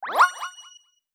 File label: Get Key; azaraka